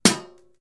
metal, Guns hit

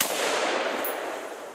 galil fire, Guns

